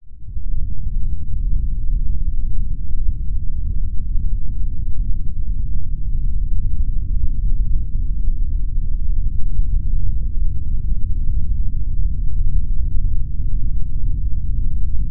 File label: sound design lfe rumble earthquake